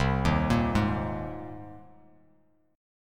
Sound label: C Minor 7th Sharp 5th C Bass Standard; Cm7#5 arpeggiated; JGuitar